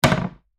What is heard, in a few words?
Household Trash Can Lid Hamper Wood Close Foley; Garbage; Blastwave